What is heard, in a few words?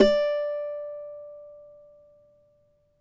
CAVAQUINHO D, Guitars; Spectrasonics; Hans Zimmer Guitars VOL-2